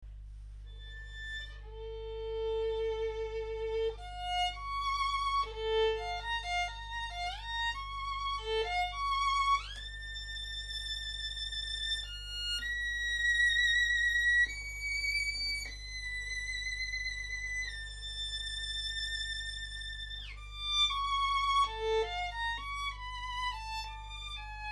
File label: violin